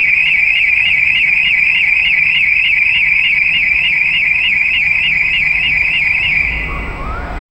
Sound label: a distant emergency alarm echoing through a large building